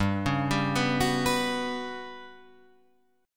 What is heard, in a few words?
G Major 7th Flat 5th G down Guitar Drop D